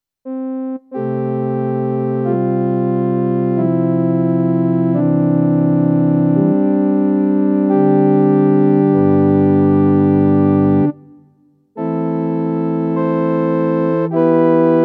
CN35 Orgel

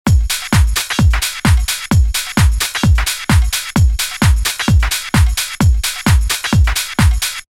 Loop mit Sony EQ

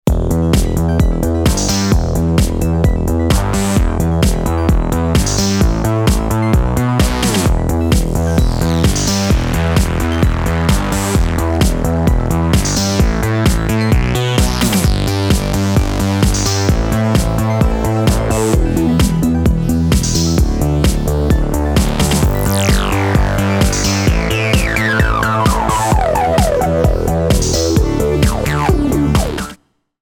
russian filter loop bassig

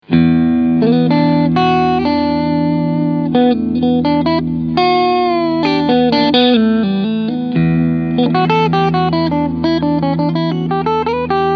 Cleansound Front Mitte

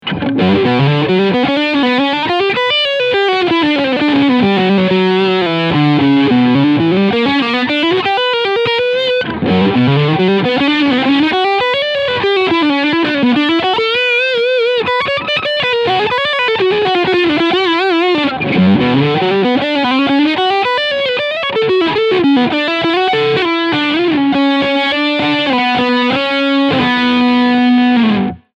Ibanez PWM20 Overdrive Lead Steg Pickup

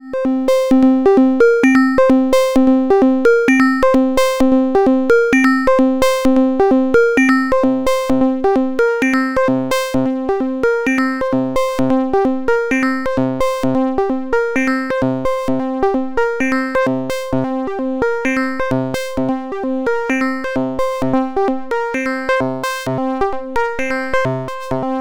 Rossum Electro Music Trident Zing1 dann Zing2

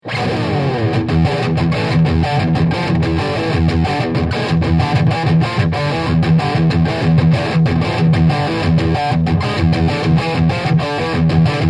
Lead Mikrofon

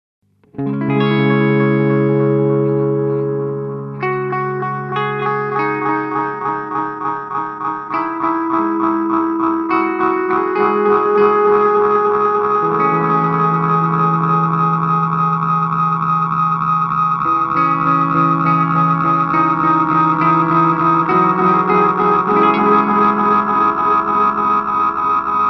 Way Huge Aqua Puss Oszillation

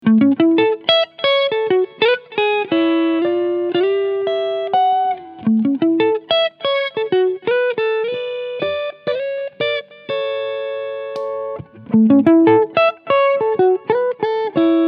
AZ 2204 Front SC Ohne und MIT Alter Switch; Ibanez

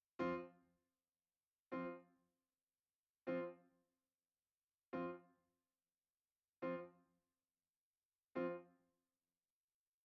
Delay Feedback wird hochgeschraubt; Fusionbox

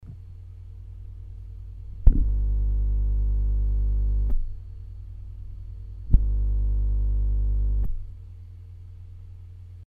behringer Bv4038 brummen

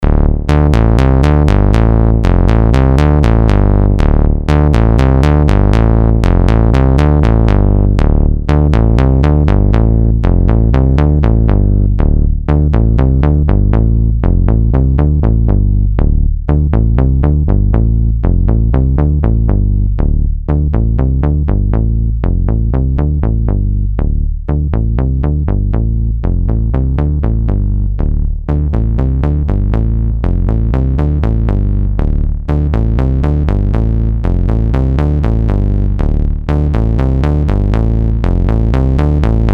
Sequenzerlauf

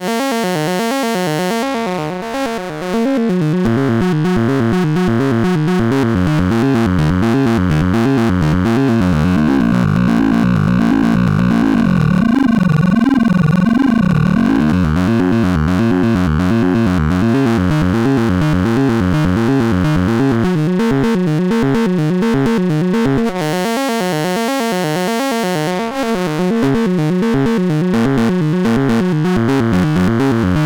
ADSR VCA LFO Waveshaper ATTACK Aenderung; GRP Module

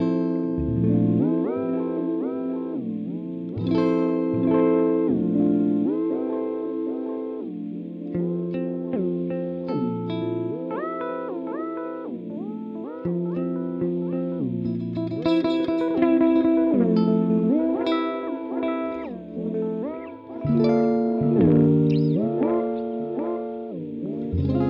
Chase Bliss Audio Thermae Step Intervalls

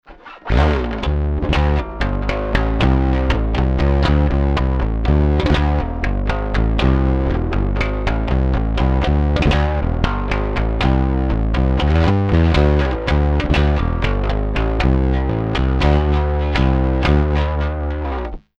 Rickenbacker Hals PU DRIVE voll TONE voll Level und Clean Mitte

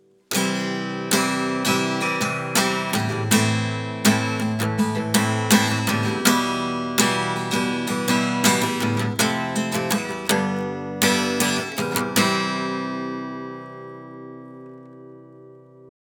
Gitarre, LPF Boost 5 bei 10kHz Atten 5 bei 20 kHz

